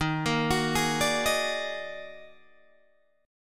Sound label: D sharp Minor Major 11th, Guitar, Open G